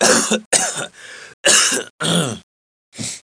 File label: coughs; player; blade; choke